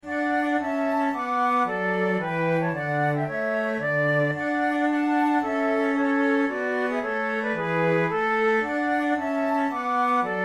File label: trio sonata gavotta, flute tunes; corelli